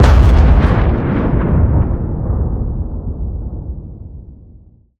explosion outdoors large; video game; Bluezone Corporation

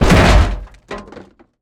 annihilation mech footsteps; robot; Bluezone Corporation

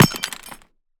weapon grenade launcher; Bluezone Corporation; Steampunk

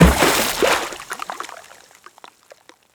water splash impact; Bluezone Corporation

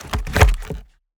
forest wood log handling; Bluezone Corporation; Nature

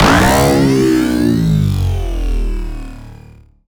robot transformation texture; Bluezone Corporation; Transformers; soundwave

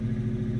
engine idle; simpra

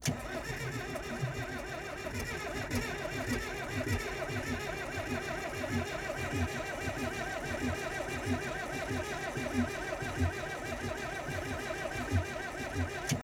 Start Try, engine; simpra

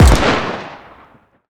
weapon grenade launcher; Bluezone Corporation

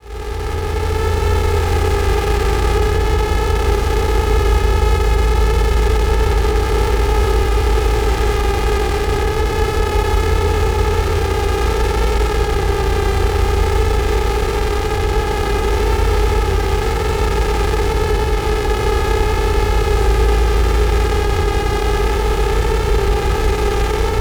ambience texture synth; trailer; Bluezone Corporation

